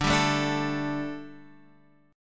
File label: A 7th Suspended 4th D, chord